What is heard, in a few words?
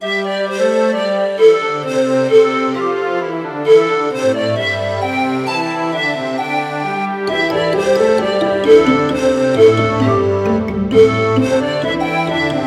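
vowels, letter O, karaoke; glasnyye bukvy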